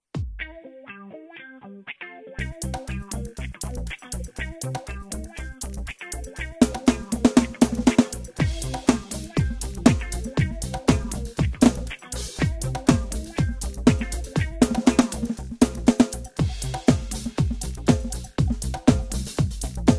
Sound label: Drumit loopkit